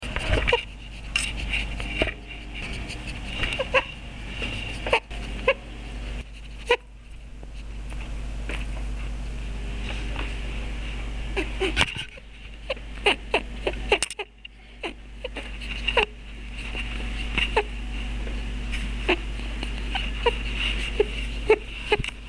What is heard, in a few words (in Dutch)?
trompetgeluid konijn